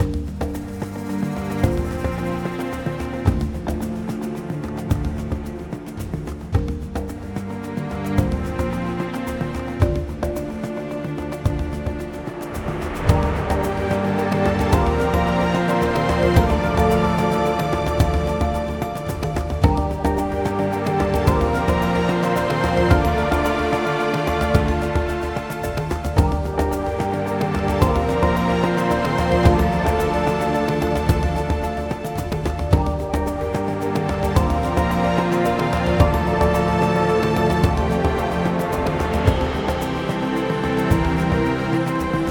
Short Intro Percussion Ending

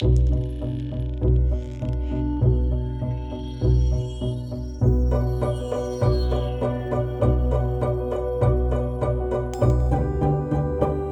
Pizzi Strings; urban

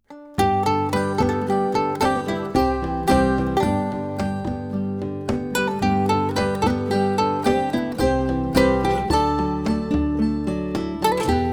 Acoustic Village; klangbeispiele 2024

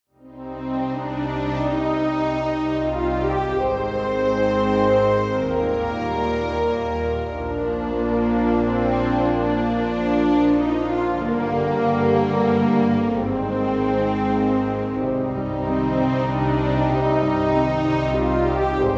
Flaming Strgs

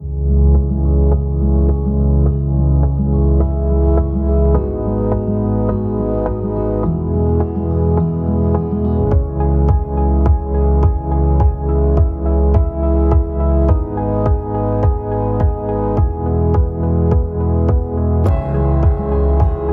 Quarter The Beat, urban

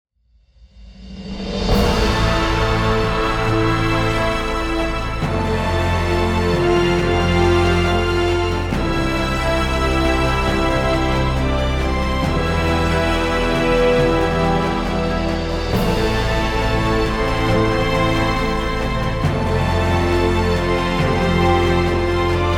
Grand Journey big no Choir

